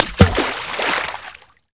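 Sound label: splash large; The Elder Scrolls III - Morrowind; Morrowind GOTY GOG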